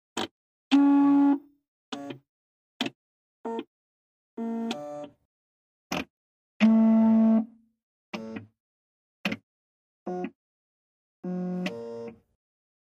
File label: Scanner